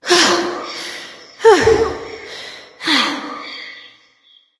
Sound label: breath light; smite; voicepack; hel